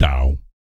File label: BASS FX; ILIO; Vocal Planet VOL-3; Jazz & FX